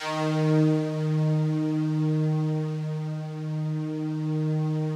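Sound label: FOG ATTACK D#2 Right, Yamaha VP-1; Music Store; 90's Sample CDs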